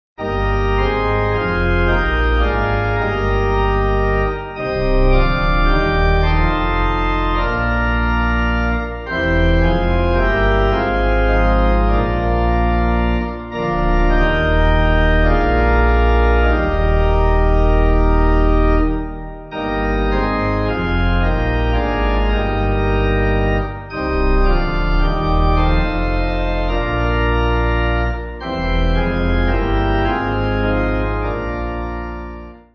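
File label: Their Names Are, Pipe Organ; Frogmore 6.4.6.4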